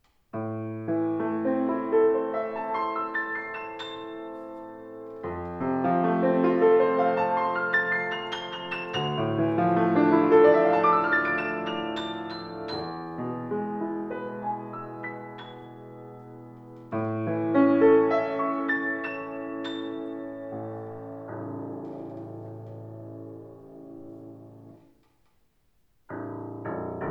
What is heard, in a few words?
Yamaha C3